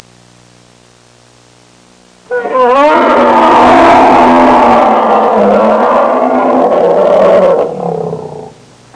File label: Miscellaneous feline roar caged